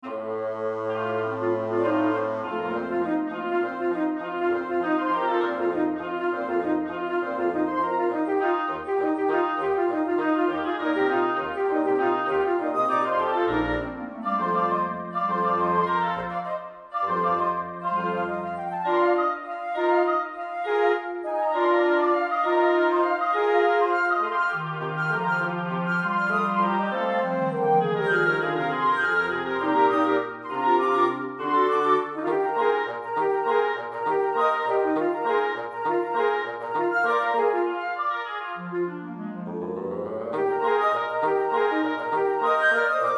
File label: Birdsong Film Score